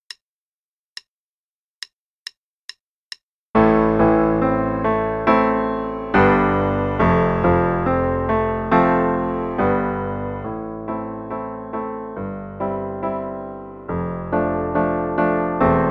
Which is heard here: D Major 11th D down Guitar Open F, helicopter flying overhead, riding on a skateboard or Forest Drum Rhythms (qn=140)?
Forest Drum Rhythms (qn=140)